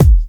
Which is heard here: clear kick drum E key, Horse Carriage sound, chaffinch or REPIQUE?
clear kick drum E key